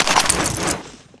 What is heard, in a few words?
deagle clip draw, weapons